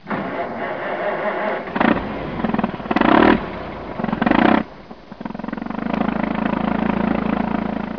vehicle

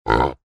oink pig cerdo

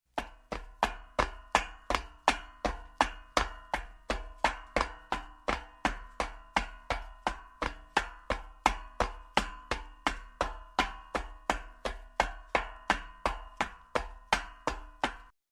footsteps down steps shoes

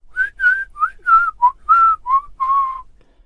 whistling

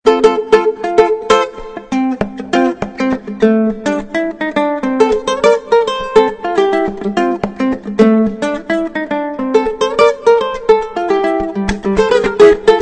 remix guitarra; mario bross

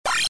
touch enemy; mario

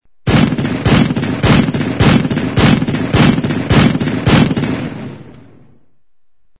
APC FIRE

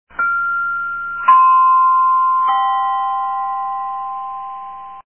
chimes

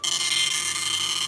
printer

